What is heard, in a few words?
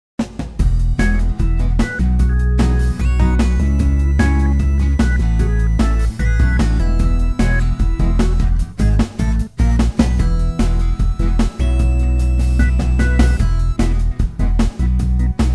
I am playing 1 2 3 karaoke